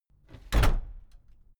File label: case lock close reverberant; objekte; tueren